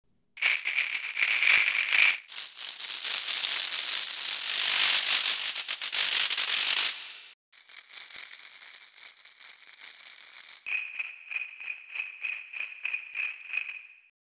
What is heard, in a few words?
Shakers